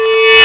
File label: DROP; eCS 1.00; MMOS2; SPACE